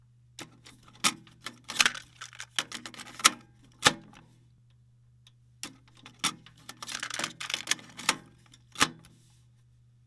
Tape Reverse Side

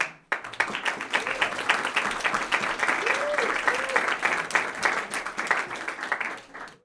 Small audience claps yuhuu

